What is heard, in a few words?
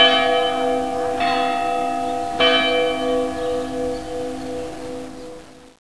cbell